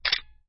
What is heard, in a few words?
camera click; Picture Keyboard